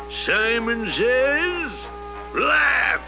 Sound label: laugh